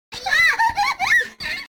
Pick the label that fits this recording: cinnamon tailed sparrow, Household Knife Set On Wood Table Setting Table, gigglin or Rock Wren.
gigglin